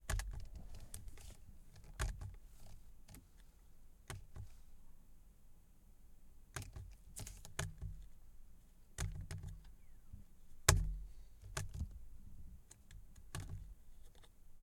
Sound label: audi rs4 gear shift paddle; sonniss gdc